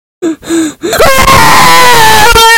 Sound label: death scream